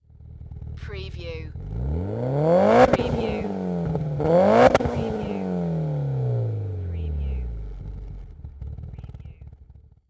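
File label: MITSUBISHI EVOLUTION EXHAUST DOUBLE REV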